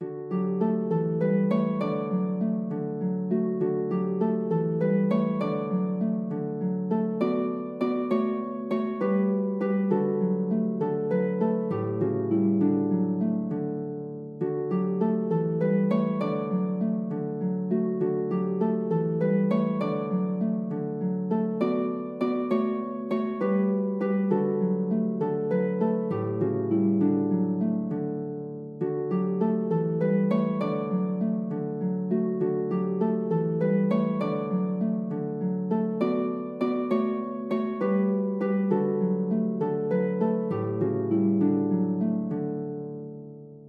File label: hickory dickory dock harp